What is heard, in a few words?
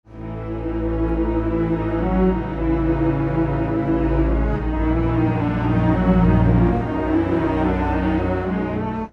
strings; pitchshift